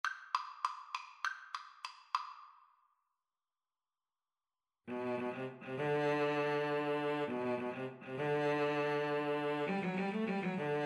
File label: Cello Duet